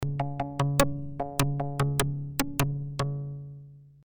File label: ASR-10 1 voice